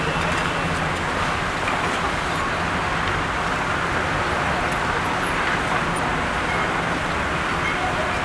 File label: ambi town; Mafie 1